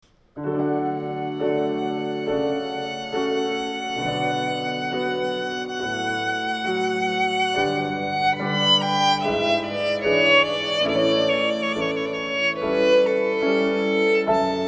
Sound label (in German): Air Bach klassisch